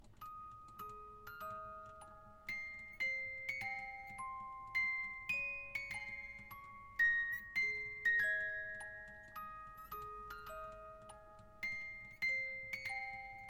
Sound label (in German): Hymne Italy